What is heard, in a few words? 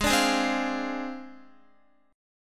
Ab 6th Flat 5th, Guitar, Ab6b5 chord; Ab6b5 strummed; JGuitar